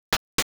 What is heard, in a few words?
footsteps loop slow; movement